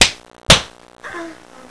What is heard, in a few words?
spank